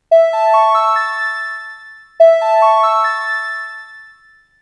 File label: NTV chime